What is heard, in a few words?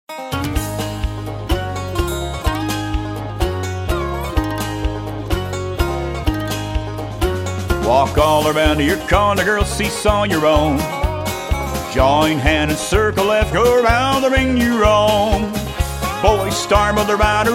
vocal